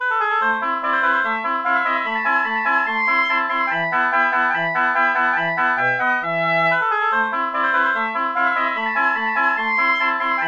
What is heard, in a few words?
oboe